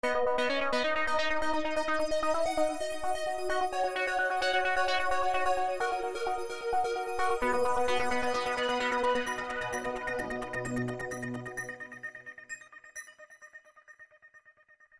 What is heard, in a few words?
Level Win; JustAxe